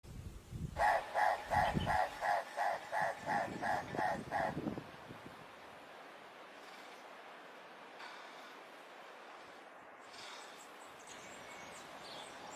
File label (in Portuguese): canto do turaco de knysna